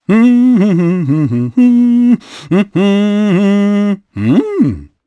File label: Vox Hum, jp; kingsraid; voices heroes jp